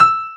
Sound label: run; AnimalZoo